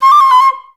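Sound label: flutes C5; Best Service; Peter Siedlaczek's Advanced Orchestra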